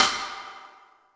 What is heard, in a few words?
snaredrum, Yamaha RM 50